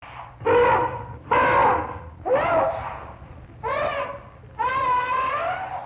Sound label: Elephant